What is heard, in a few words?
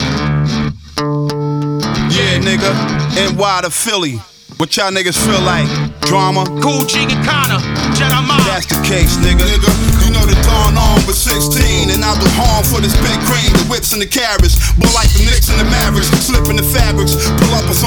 Animal Rap, Underground Hip Hop